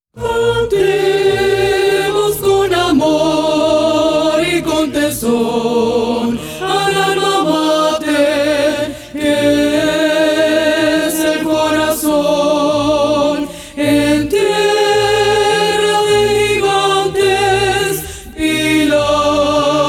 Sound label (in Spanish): Himno de UPR Carolina Altos, Coro; coro-y-banda